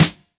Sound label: clean snare sample E key